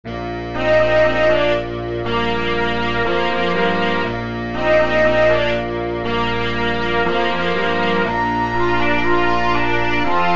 sans trombone

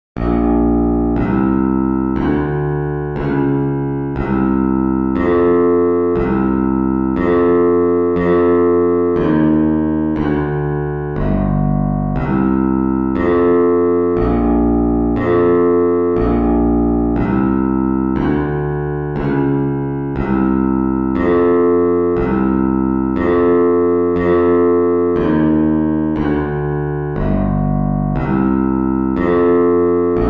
bass guitar

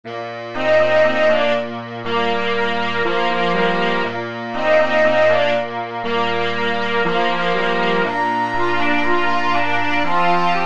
sans tuba

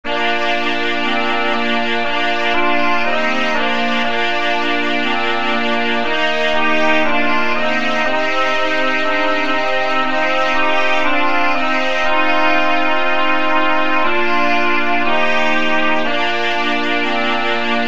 sans flute

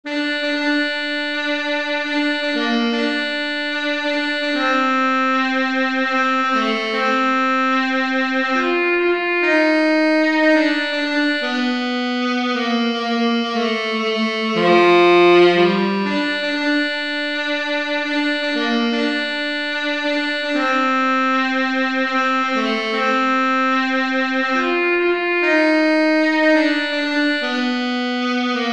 tenor sax

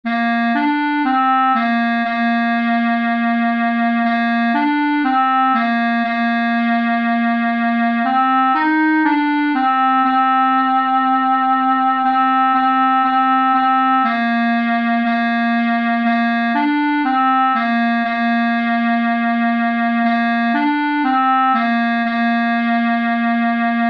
jingle bells clarinet

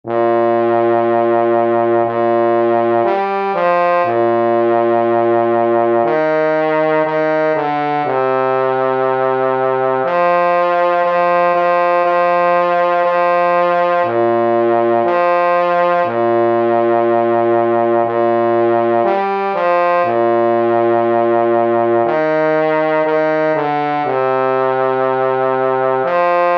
jingle bells trombone

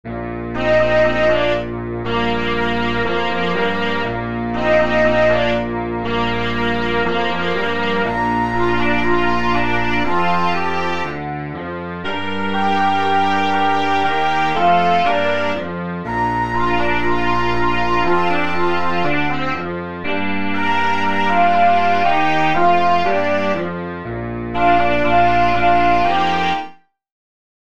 sans tenor sax

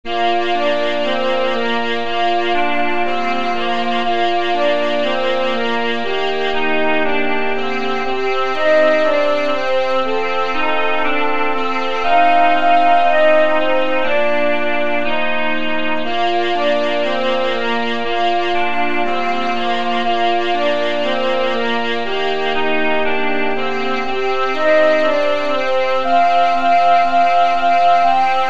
sans trumpet

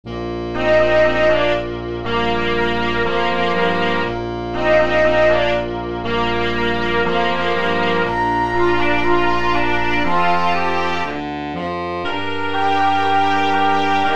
sans bari sax

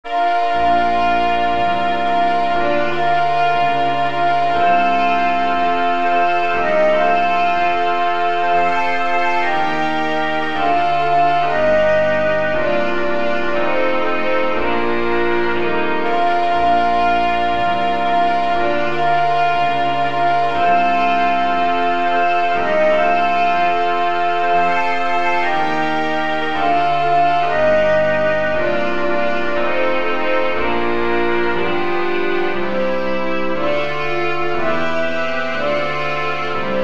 sans bari sax

